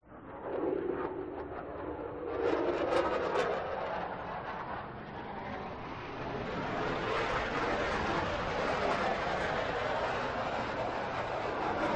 f 16 jet fighter passing by and vanishing in the distance long tail; sound ex machina